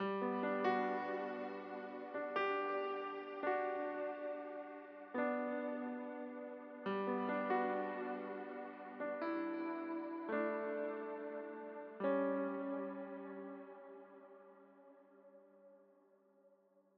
SONG INTRO PIANO WET; KONTAKT LIBRARY

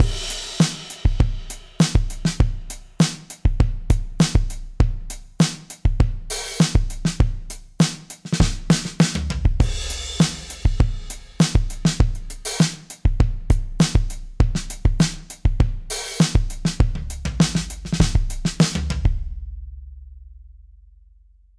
FUNK GROOVE DRUMS FULL

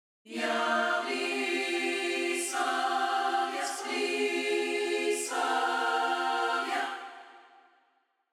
COMP EQ ON; KONTAKT THIRD PARTY 2 DEMO

